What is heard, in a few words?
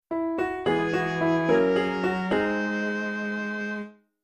jingle promotion; newts jingles